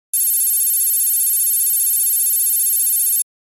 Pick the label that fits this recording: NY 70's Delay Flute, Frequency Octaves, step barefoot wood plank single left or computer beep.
computer beep